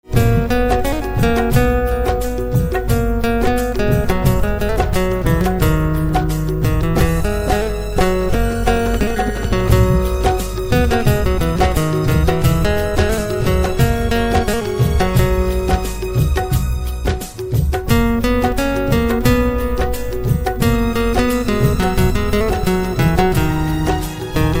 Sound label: Guitar